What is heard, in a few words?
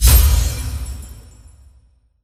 Full Charge; Aladdin; Tales of Arabia pantheon